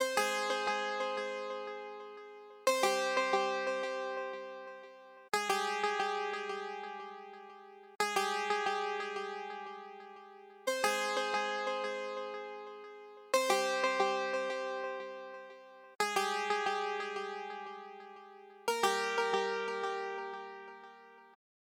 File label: 90 BPM F Min Lead; Melody Loops; Cymatics; ANALOG EVOLUTION Beta; RnB Collection